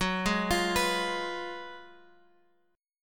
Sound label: F sharp Major 7th Suspended 2nd Suspended 4th, Guitar, Open G